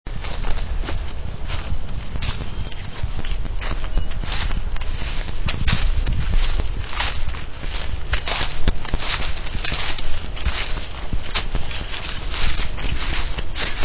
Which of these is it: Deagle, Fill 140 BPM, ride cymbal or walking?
walking